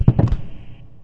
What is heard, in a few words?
death; alienarena; femforcer